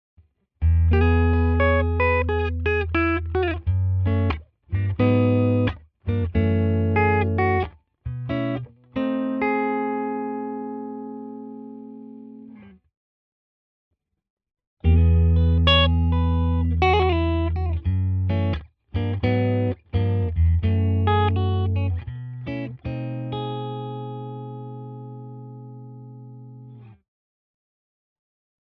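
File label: Clean Jazz Fingerstyle, guitar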